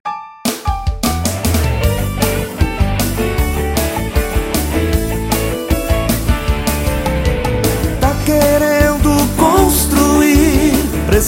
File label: jingle